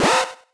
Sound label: Alarm; Free SFX for anything